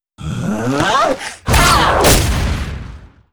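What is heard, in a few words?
ghost attack, monster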